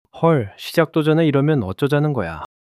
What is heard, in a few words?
surprise